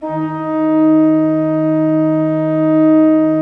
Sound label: D3, Cathedral Organ; Propeller Island; MIDI-ORGAN A